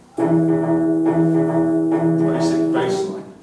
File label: Bassline Layer 1; Whooverville 5